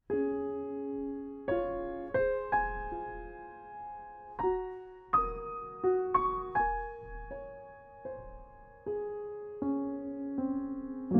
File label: Main Title Piano Version; The Patriot